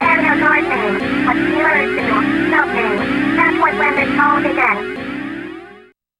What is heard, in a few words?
Warning; Thunder Force V; PSX